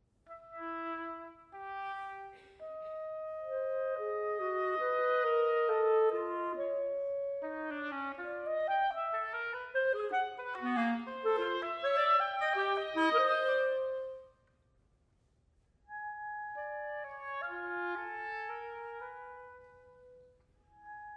duo for oboe and clarinet moderato; riegger

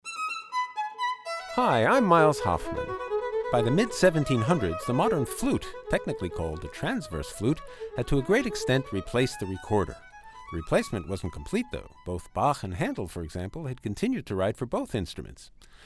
flute

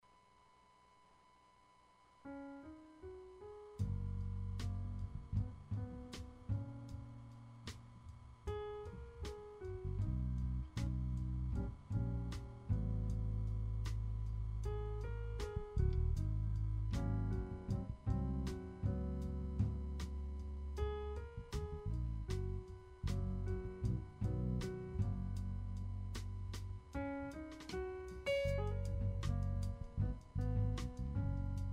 jazz

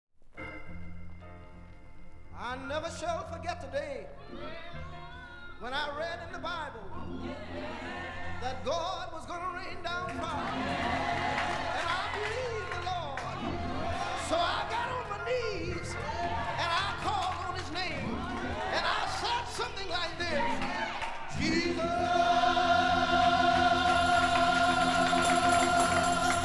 choir

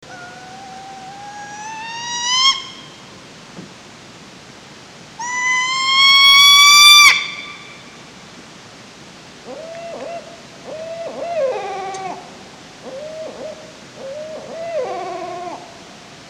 barred owl; bob pearson

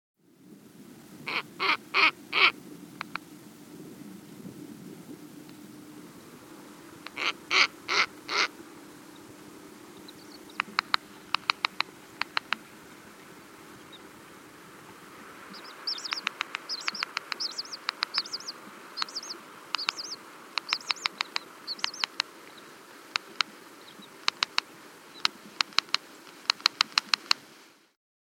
Snowy Owl Female bark calls and bill snap

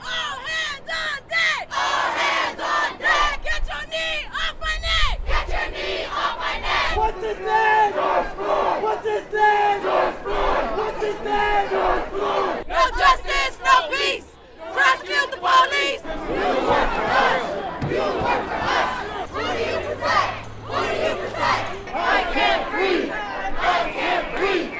protest chants

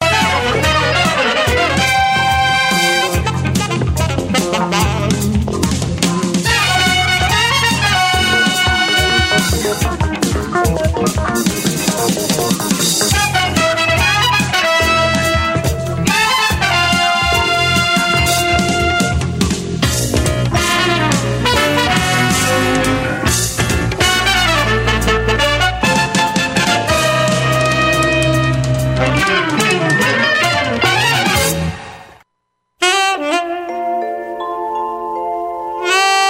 jazz

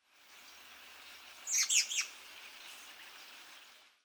american robin flight call; paul marvin

